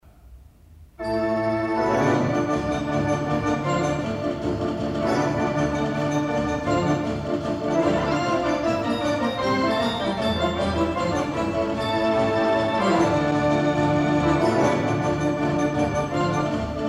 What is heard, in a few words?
cinema organ; Wurlitzer; Granada, Bedford; Since 1934 at the Granada, Bedford, the console of the mighty Wurlitzer would appear